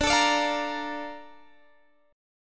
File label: A sharp Major 7th